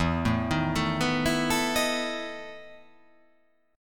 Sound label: E Major 7th Suspended 4th E